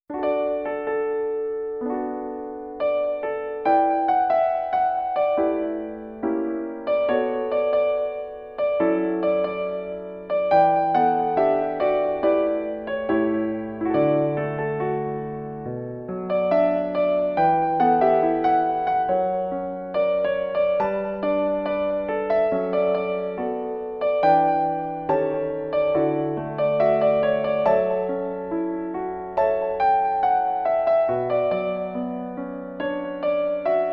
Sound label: Piano Arrange Version; solfege vocal collection